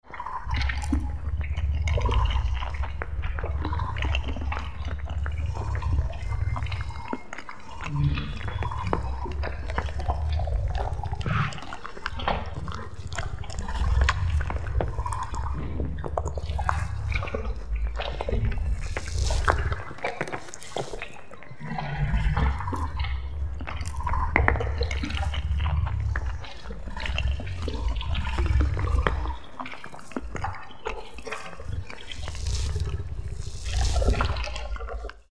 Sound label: aliens eat atmo